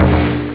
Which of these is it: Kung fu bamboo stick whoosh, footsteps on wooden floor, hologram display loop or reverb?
reverb